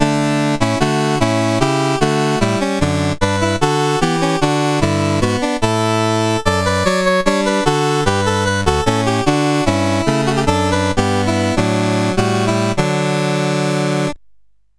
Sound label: menus, sid CSG8580R5; Games Summer Edition